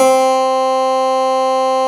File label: Ele Korg C4, Fairlight CMI IIe Keyboards